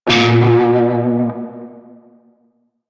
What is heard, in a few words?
Shawzin Flourish Oct One A Sharp; Music; Warframe; Narmer Shawzin